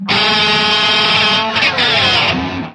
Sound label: sick lick, guitar noizes